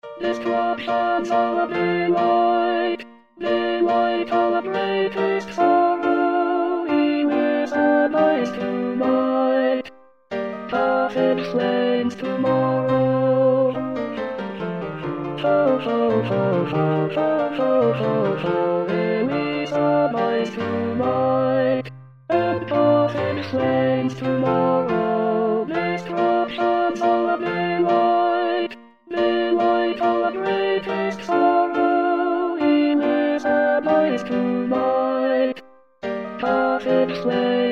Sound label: destructions our delight, Tenor